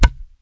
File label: grass; Mafie 1